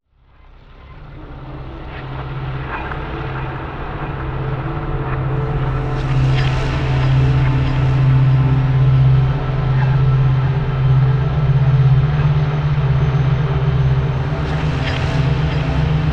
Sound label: Granular Texture Waters Edge Cm 70 Bpm WET; Ghosthack